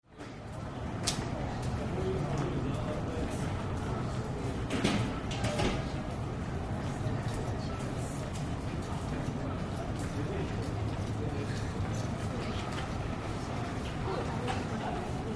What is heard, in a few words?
School Hallway High, crowds ext